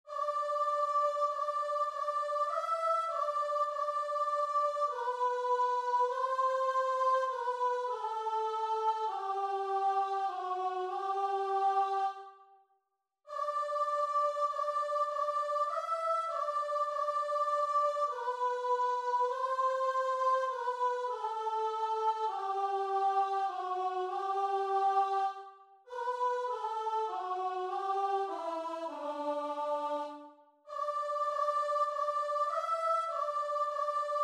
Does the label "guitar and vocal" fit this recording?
Yes